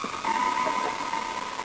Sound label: Sea Sounds